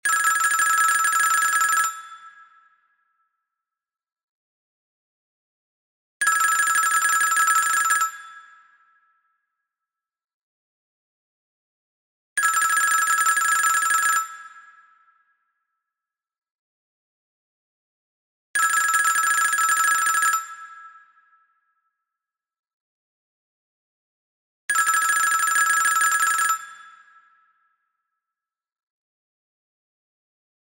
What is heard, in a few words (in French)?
Telephone suisse